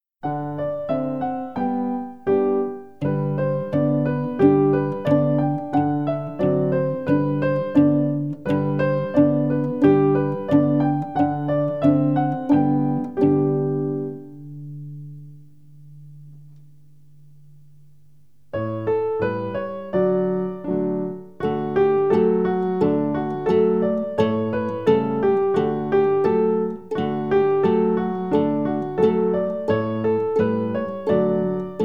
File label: Open Strings Pizzicato D, A, D